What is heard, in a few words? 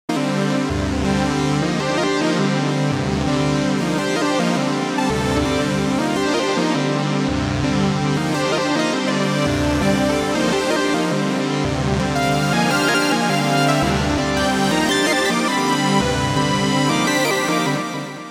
Synth Only; Cosmology